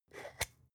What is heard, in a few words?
spice jar scratch tonal open hard; industrie wirtschaft; gastronomie